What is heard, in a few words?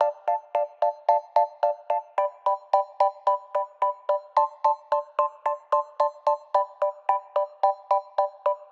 Electric Piano, Loops, Keys